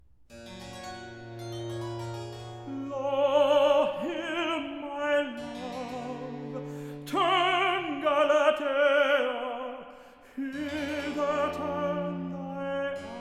Recitative; Lo, here my love; Handel Masterworks

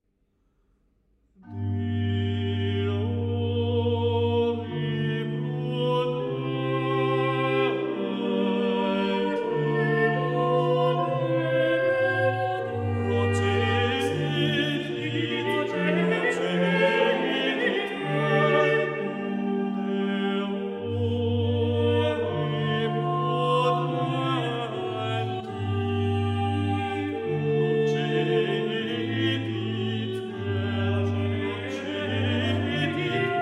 Motets, motet a trois voix et continuo; De ore prudentis; Klassika; Gregorio Allegri; Miserere - Messe - Motets

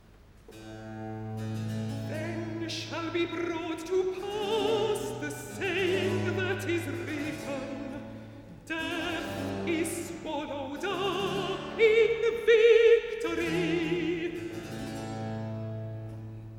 Recitative alto; Handel Masterworks

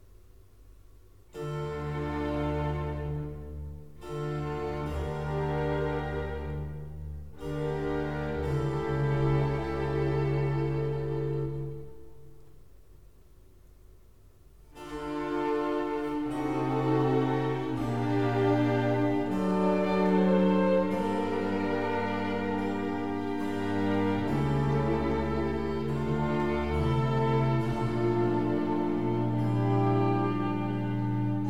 Grave - Andante largo, Conciertos Grossos; Concerto No.2 in F; Arcangelo Corelli; 12 Conciertos Grossos Op.6 (1966)

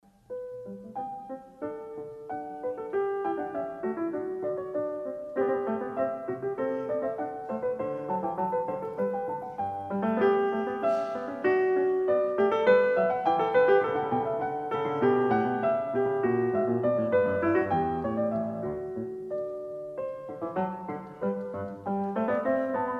Bach Goldberg Variations

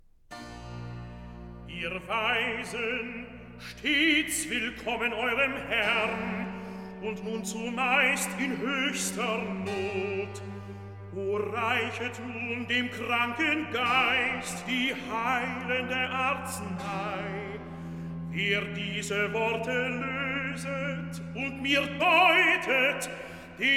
Recitativo & choir; Ihr Weisen; Handel Masterworks; Klassika

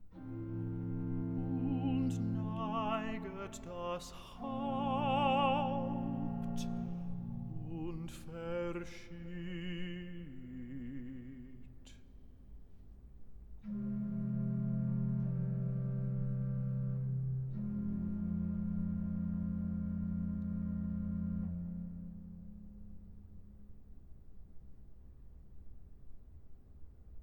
Recitative; Und Neiget Das Haupt Und Verschied; Klassika; Bach, Johann Sebastian; Choral Works; Johannes Passion BWV 245

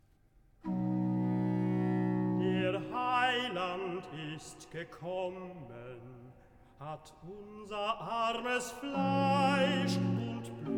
Recitativo; BWV 61; Nun komm, der Heiden; Der Heiland ist gekommen

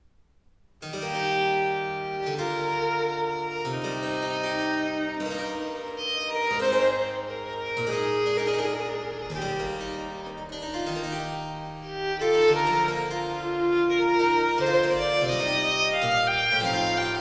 Sonate 10 in F major 1. Preludio, adagio; Arcangelo Corelli; Corelli - Complete Works; Klassika

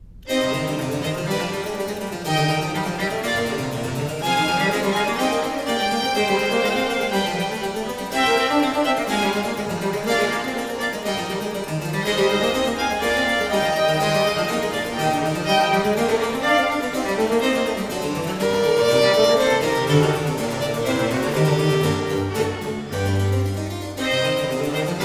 Sonate 8 in D minor 2. Allemanda, allegro; Arcangelo Corelli; Corelli - Complete Works; Klassika

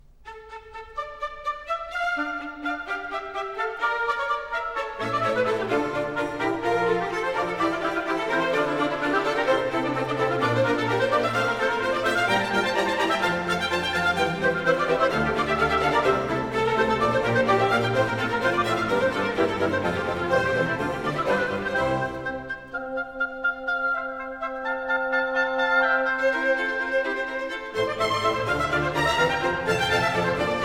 Concerto Grosso in F major; Handel Masterworks; Klassika

